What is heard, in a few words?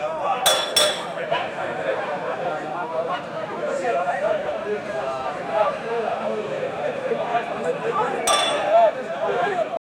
outside a pub in, pub